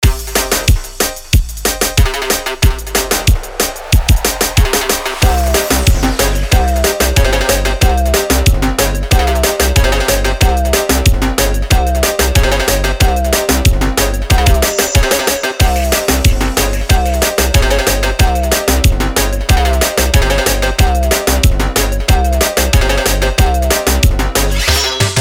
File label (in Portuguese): TECNO BREGA